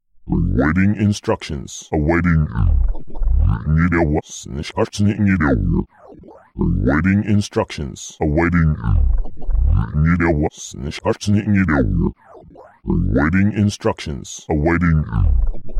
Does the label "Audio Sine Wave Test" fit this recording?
Yes